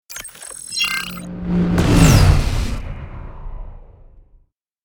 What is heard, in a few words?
Futuristic trailer transition